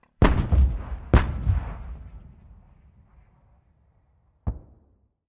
105mm Howitzer: Fires, Close-up, Downrange Explosions. Dual